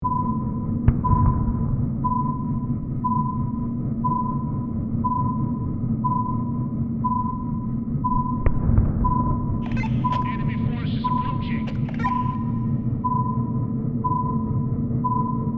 Underwater battlefield submarine sonar with explosions